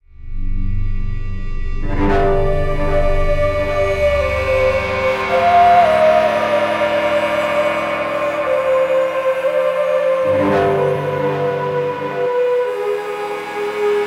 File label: Victory 2 (Low); assassins creed origins unofficial soundtrack ps4 windows xbox one gamerip 2017